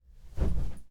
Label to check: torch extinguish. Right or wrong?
Right